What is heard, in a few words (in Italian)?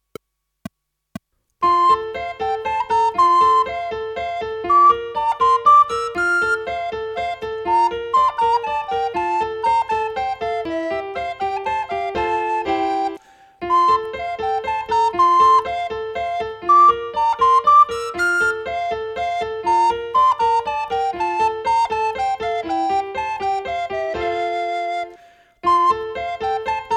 minuetto; musica in classe